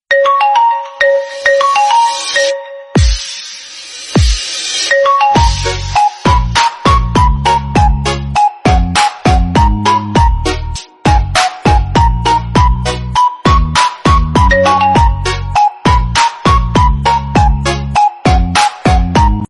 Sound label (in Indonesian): Nada Dering Asik Ringtone